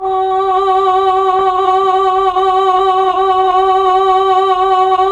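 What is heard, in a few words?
voice Chamberlin vox; Roland LCDP09 Keys of the 60s and 70s 1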